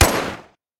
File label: shoot; EMXArms; emx umx32; emi mk5